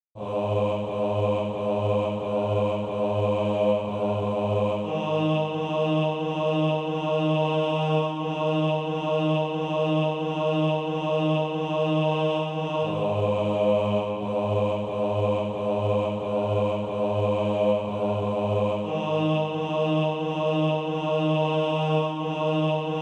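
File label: tenor, Practise Music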